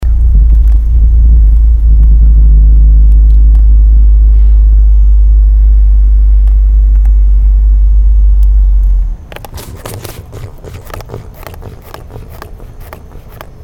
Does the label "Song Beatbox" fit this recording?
No